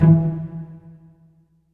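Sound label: cello pizzicato E2, Virtuoso Strings; ILIO; Kirk Hunter Virtuoso Strings VOL-3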